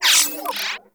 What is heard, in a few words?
Digital Glitch; Ambience; Warframe; Corpus Railjack